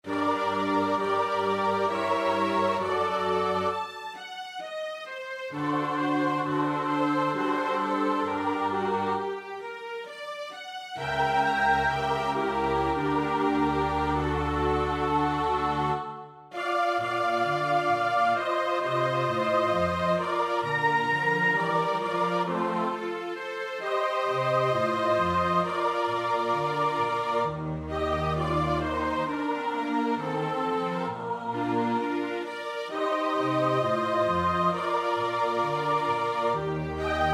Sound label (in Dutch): S-A, koorpartij oefening; Pergolesi (Giovanni Battista); Stabat mater